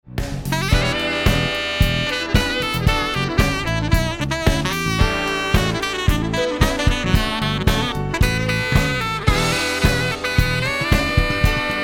Sax Solo in Mix

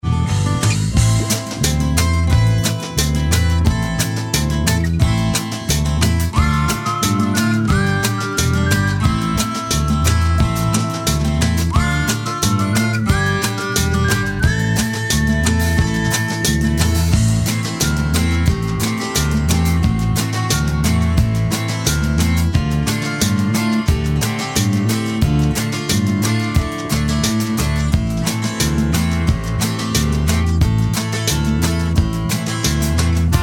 MixBuss; xfilter

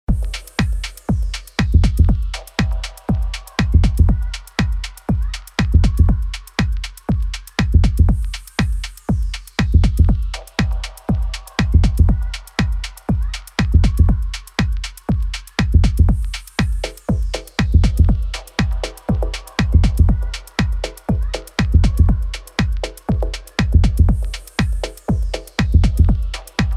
Mix Buss Dark Techno bypassed

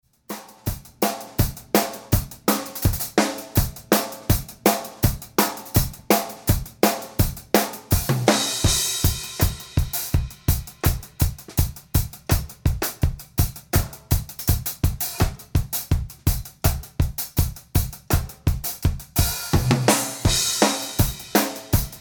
Drums With Mono Drum Room

